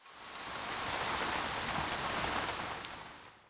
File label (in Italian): Acqua, natura